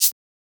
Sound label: Shaker; FLPRO